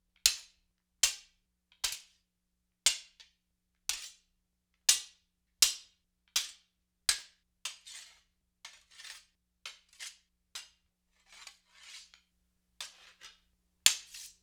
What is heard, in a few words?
Norse Sword Katana Blade on Blade, Weapon on Weapon; StillNorthMedia